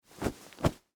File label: Dress Shirt Adjust, Foley, Clothes, Dress, Shirt, Adjust, Movement; Dressing Sound Effects